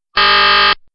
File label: Buzzer wrong